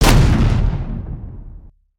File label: charge